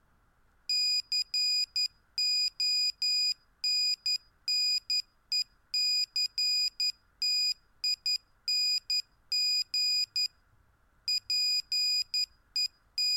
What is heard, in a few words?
Ascending